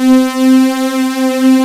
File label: LEMETRO LEAD, ROLAND D-50; Rubber Chicken Software Sample Bank